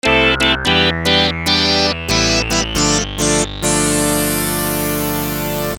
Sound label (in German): P600 Sequenz; gligli